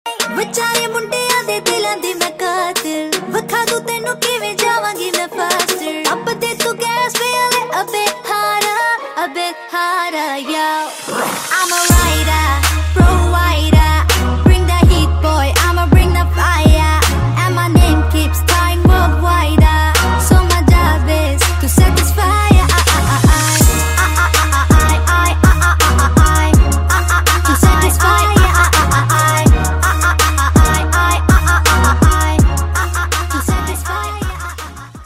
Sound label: Female Ringtone